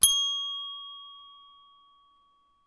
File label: Bell Ding